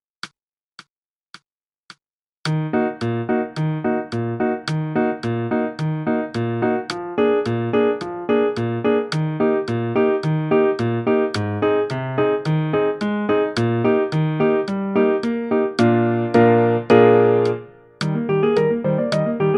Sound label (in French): fantaisie clic